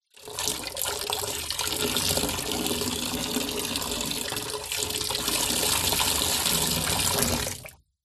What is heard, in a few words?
nature water pour into shallow water; zapsplat